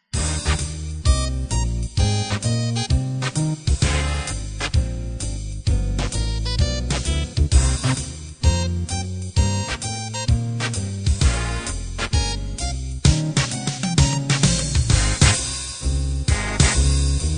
swing band

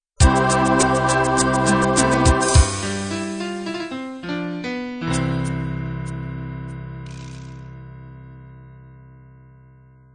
natural beat end

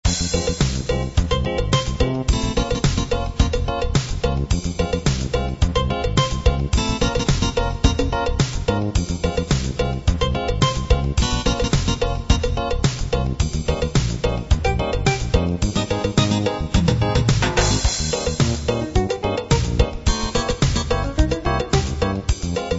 reggae

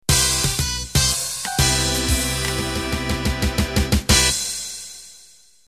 Fox Trot End

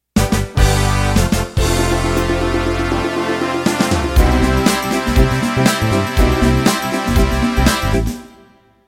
Motown Intro

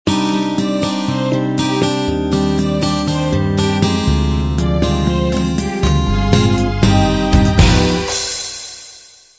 standard 8 beat intro

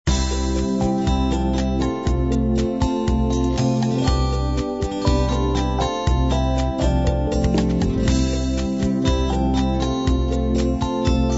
unplugged 8 beat combined

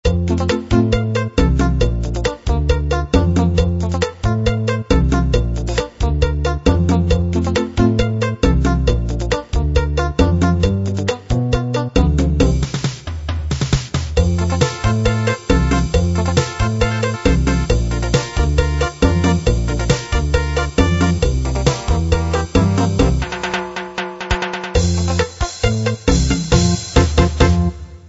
chalypso

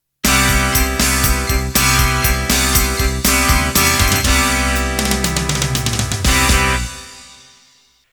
Heavy Rock End

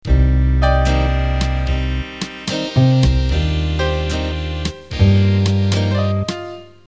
Big Band Ballad Intro

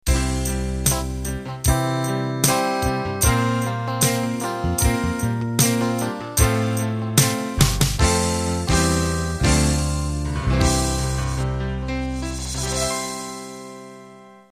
Cavern Pop End; MIDI; Intros and Endings

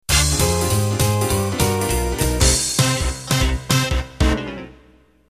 New Jive end

